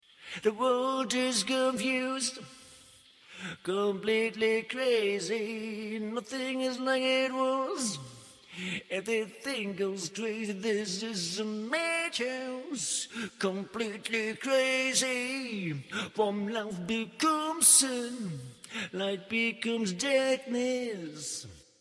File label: rock 140 A; acapella